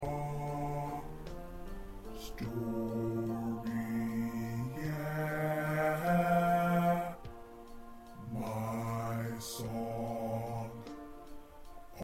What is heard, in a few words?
Choir Bass; gospel songs; part preview